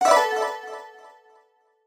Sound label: guitar scale down